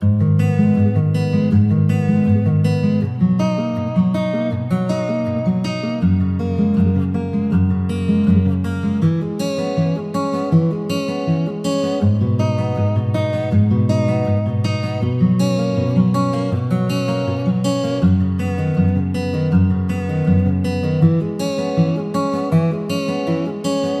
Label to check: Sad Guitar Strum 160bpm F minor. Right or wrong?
Right